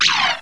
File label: click